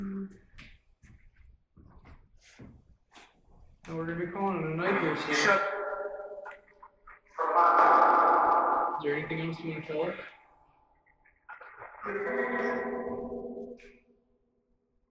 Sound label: Phasma Box; Barbershop Corner